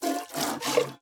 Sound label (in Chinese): 牛挤奶声